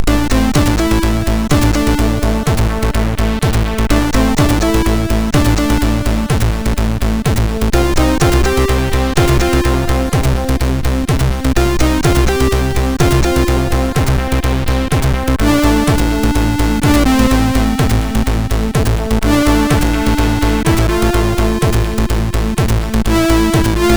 Intro, MOS6581R4

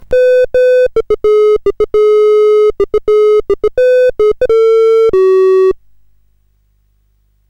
CSG8580R5, GAMES; Sammy Lightfoot

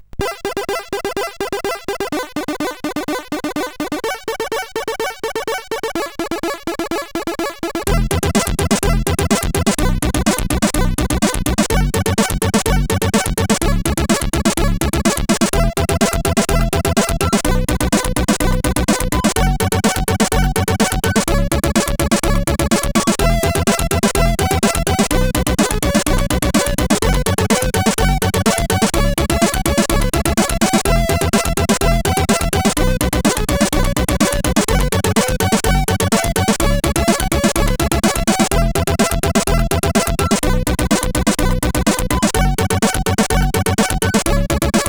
Hard Bass, CSG8580R5

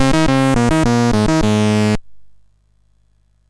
MOS6581R3, GAMES; Spikes Peak

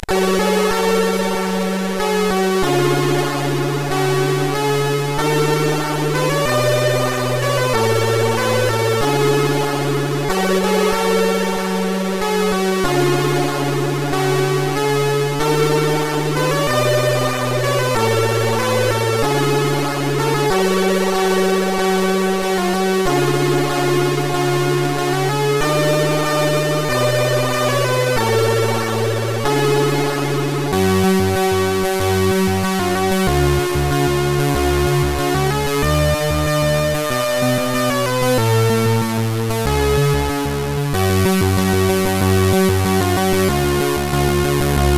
Naught, CSG8580R5

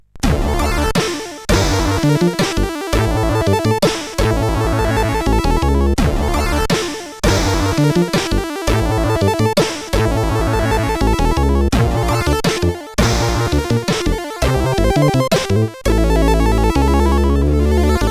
CSG8580

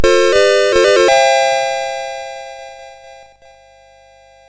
Buggy Boy, MOS6581R2

